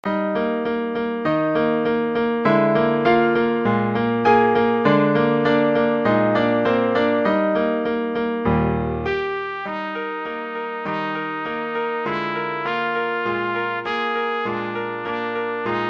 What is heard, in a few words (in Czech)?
bouree trubka; Přednesová skladba pro trubku; ch w gluck